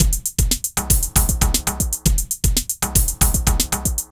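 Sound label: drum loops, right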